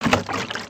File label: fish buck